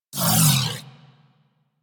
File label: Crewship Turret Proj By, Corpus; Warframe; Rail Jack